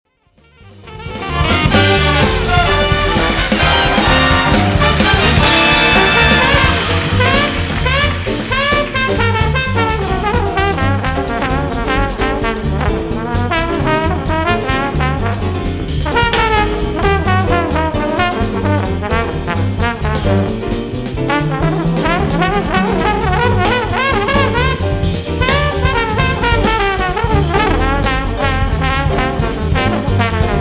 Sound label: upswing bluesy